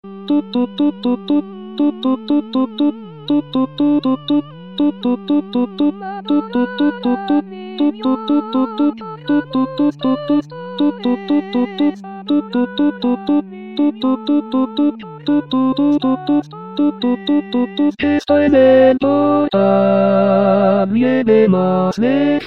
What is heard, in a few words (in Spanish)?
adorar al nio tenores